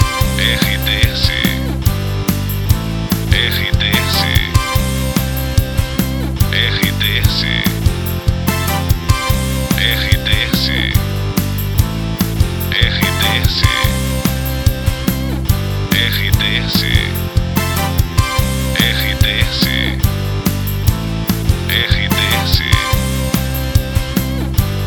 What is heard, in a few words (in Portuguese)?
TRILHA PARA LOCUCAO WEB NET POPULAR